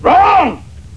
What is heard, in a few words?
wrong